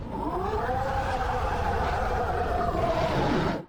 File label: kaiju roar; Interstellar